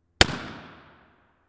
reverb courtyard; Shanahan

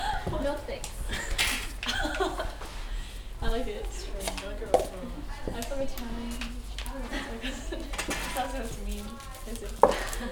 Athenaeum library tea; CMC

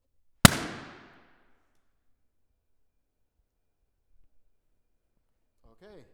Litlle Bridges Front Stage; Pomona

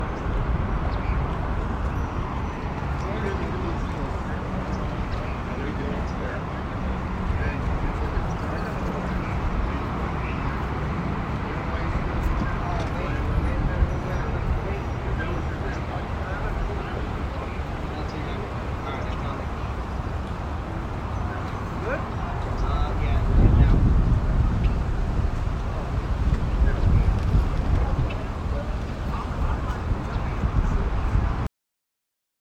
Golf Course; Cresta Verde

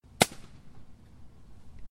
walkway to Frary

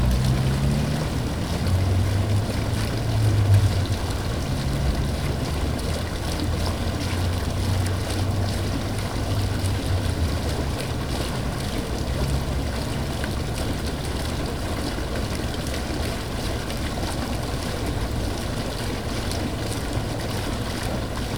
soundscape fountain; mason fountain

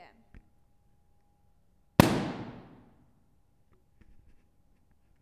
reverb Floor; Pomona; Pendleton

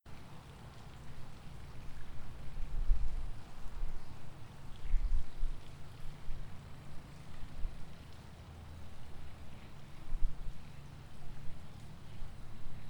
court soundscape; Hixon

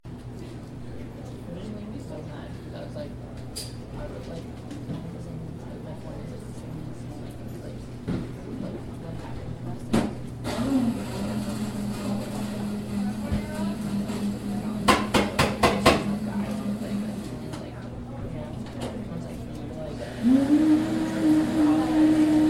soundscape cafe; honnold

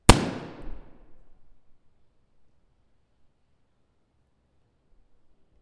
Balloon Pop; SCC

